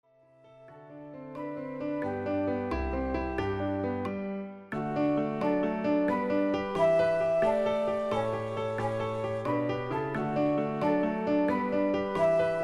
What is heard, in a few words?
playalongs flute; acompañamientos de piano; flauta y piano